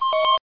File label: Lock on; Risc World 8; SF3000